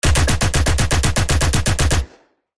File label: Automatic Weapon Fire